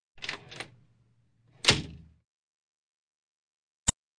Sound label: Track, Doors; 헐리웃특수효과음; 문 소리